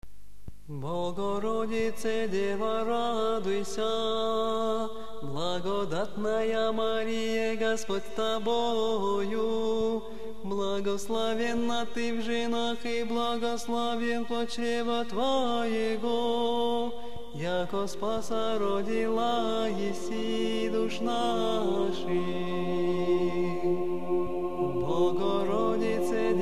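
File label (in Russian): Optina Hermitage choir; hor Optinoj pustyni; Bogorodica deva radujsya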